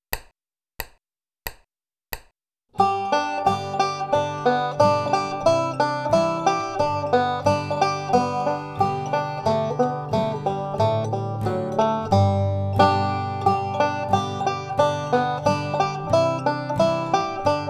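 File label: single string style, banjo; cripple creek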